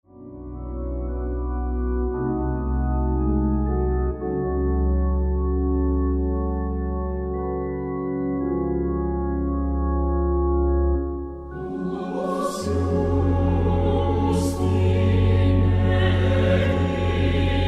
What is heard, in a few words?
Lilium Organ